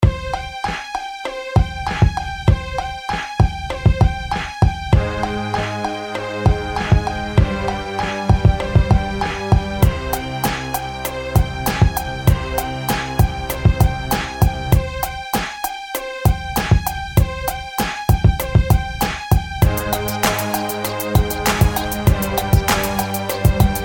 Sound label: Rap Instrumental